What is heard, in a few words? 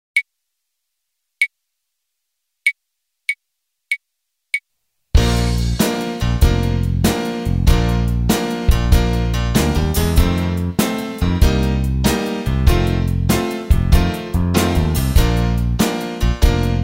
de blues